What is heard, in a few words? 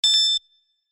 computer comform